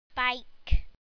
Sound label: bike